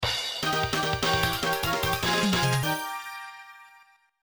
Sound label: Goal; Dash